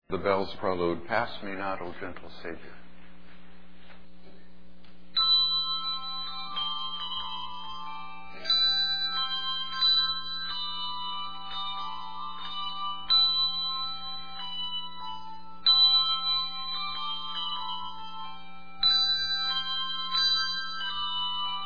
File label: Bell Choir; Call To Worship; Pass Me Not O Gentle Savior